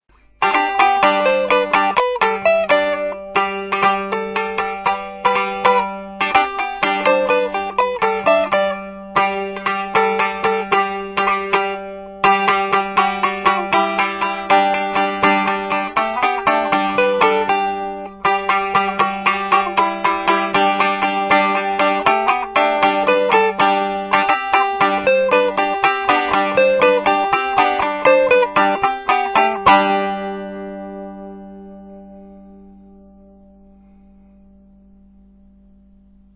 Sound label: strum; strumstick; vorbei